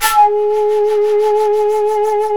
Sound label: SHAKUHACHI; World Combo